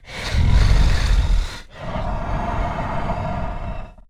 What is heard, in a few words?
Stealth Breath Idle; Evolve; Goliath